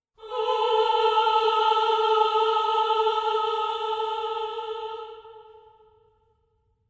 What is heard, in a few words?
Choir women